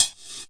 SEVGATE HHCL, gated reverse slapback kit; Hip Hop; style kits

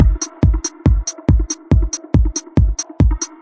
140 bpm , drum loops and breaks

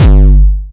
Syn Dr, Overloaded Synthetic Drums; style kits

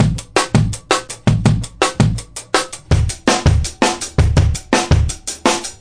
165 live two tone tek step, drum loop; 161 to 180 bpm

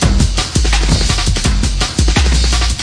DRUM LOOPS and BREAKS, 169 bpm; 161 to 180 bpm

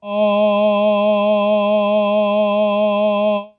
synvox G sharp2, Synthesized Male Voice

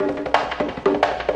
bongo 176 bpm; bongo player on meth; drum loops and breaks; 161 to 180 bpm

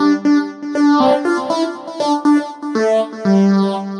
perky synth echoline, 120; SYNTH AND ELECTRONIC LOOPS

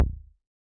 ANALOGUE BEAT, Ultra N Box 404; drum machines

